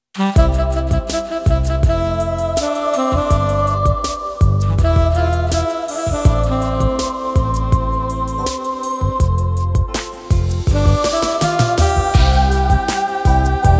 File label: Analog Ballad, Yamaha PSR-550 style